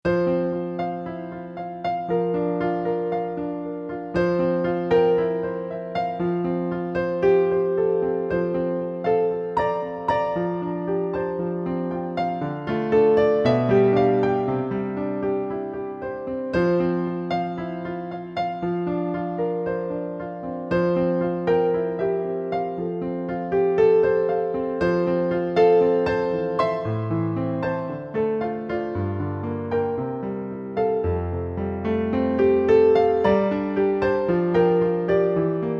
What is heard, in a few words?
Bridal Chorus piano